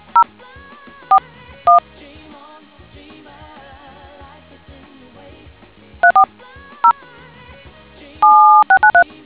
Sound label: dtmf